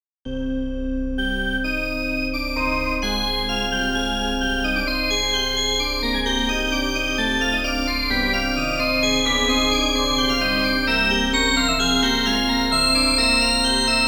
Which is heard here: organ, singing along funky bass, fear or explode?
organ